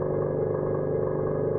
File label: bfg hum